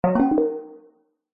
multimedia game sound chime mallet warm family game